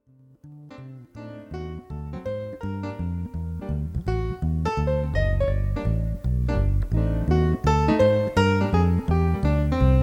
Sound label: tingl PNO